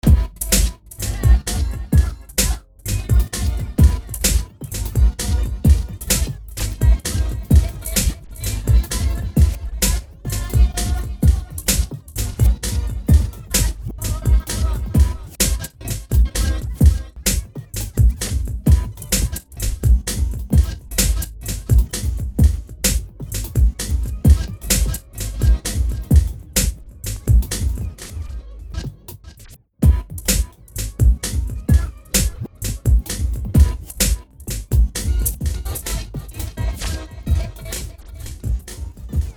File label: garagey house beat